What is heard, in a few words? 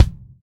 LD Kick; Roland BK-5